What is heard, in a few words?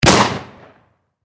Warfare Shotgun Winchester Model 1897 Pump Action Single Shot Close Perspective 12 Gauge